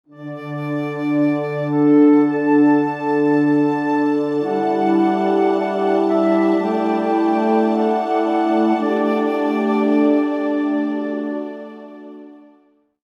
Mod Calm; Sid Meier's Civilization Revolution 2; vita

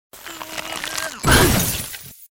Witch Armor Buff; Goddess of Trampling

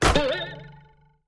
Wood Hit; bad piggies; android; mobile; windows

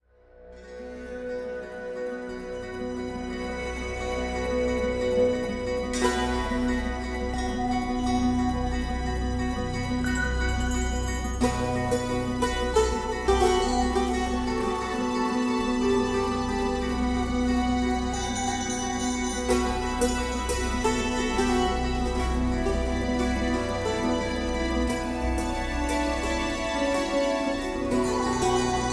Ethno Theme Summer Light Live

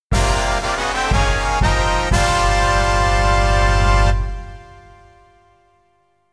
king fanfare; tales of eternia original soundtrack

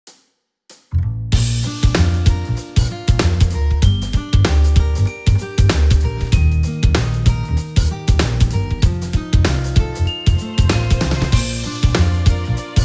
Conversation; Winged Sakura Mindy's Arc

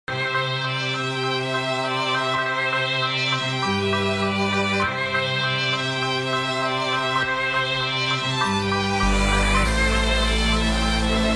Quartet Remix; Club Penguin; Ultimate Jam Event Trilogy; Windows

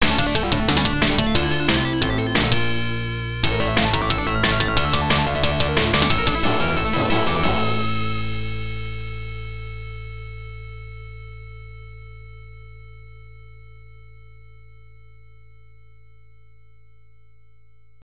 Round Clear; kozure ookami arcade

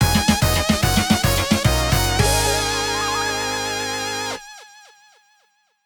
Stage Complete Stinger; Armored Acorns Action Squirrel Squad; Wii U

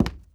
run wood; lucius

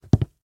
Footstep; don t be afraid the first toy

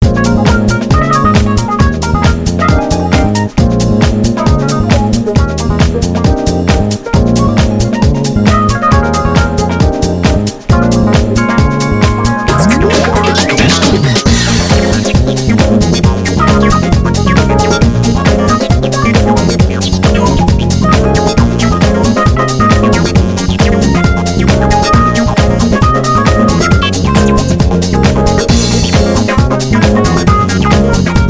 Kind Lady interlude rough instrumental; Dance Dance Revolution 6th Mix

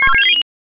Apple Crushes

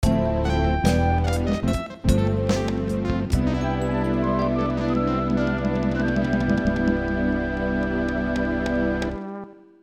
Birdman Reached Goal Area

